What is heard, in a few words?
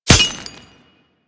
Helmet Impact; Warframe